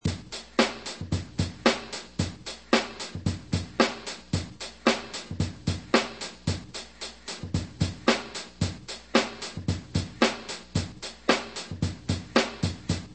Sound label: straight up hip hop beat loop bright with quarter